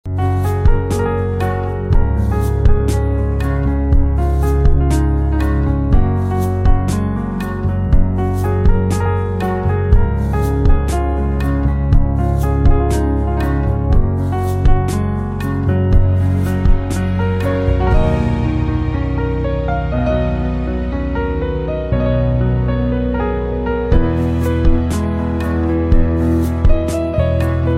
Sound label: Piano Instrumental Ringtone